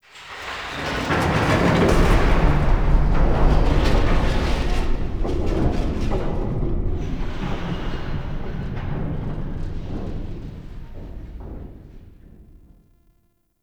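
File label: Staircase Machinery Stinger; Ambience; Gameplay; Warframe; Entrati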